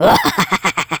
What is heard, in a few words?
skelly medium; Garry's Mod; tf2; halloween; skeletons